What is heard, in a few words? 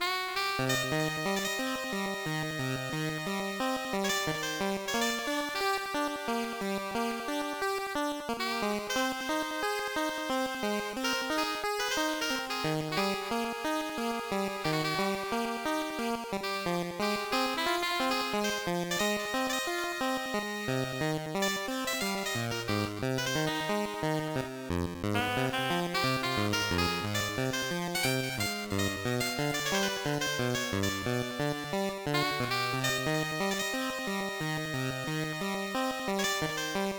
BGM; Mahjong Keiji; GBA